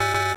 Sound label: error